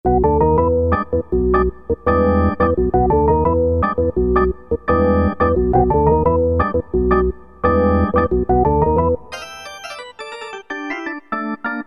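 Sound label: organ pure tone; E-mu Proteus FX; Factory Presets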